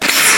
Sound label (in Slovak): výstrel